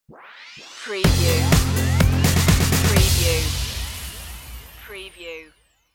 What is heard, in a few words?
liquid gold stinger